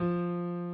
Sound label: key; keySounds